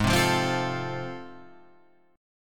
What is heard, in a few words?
A Minor Major 7th Sharp 5th, G sharp